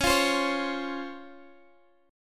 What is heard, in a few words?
C sharp Major 7th C sharp, Guitar Drop D